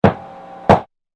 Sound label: Hammer